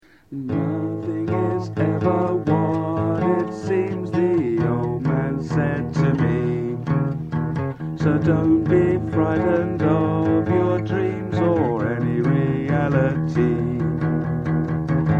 Roland Rock

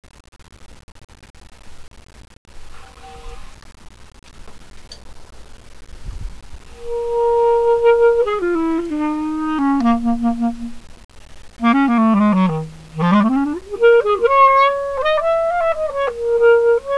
Random Improv

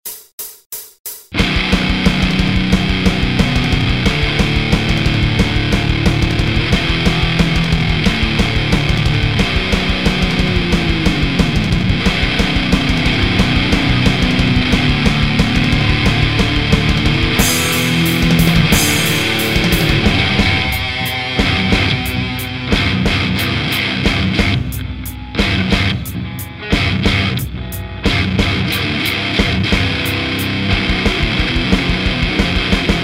Ash solo

